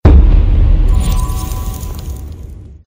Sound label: Menu sound